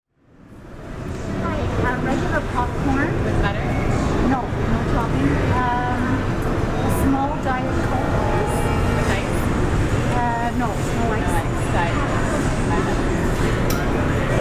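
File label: concessions counter, soundscapes, paramount; Raw Recordings; Soundscaping the Paramount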